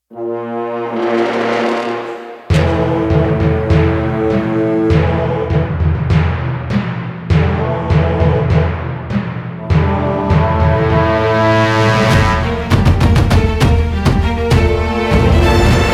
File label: TENSION LOOP